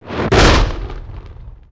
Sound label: explode